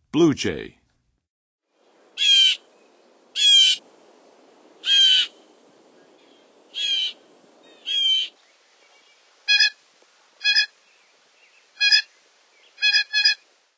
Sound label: Blue Jay, Songs of Birds; North America; Western USA; Western USA Songbirds